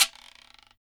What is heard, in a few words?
Guiro Long, Percussion; Roland BK-5